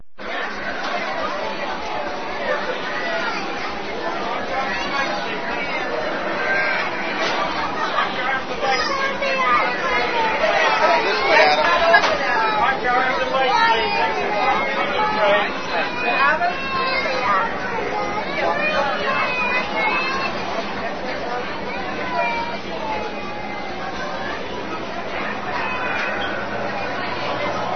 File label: Kids at party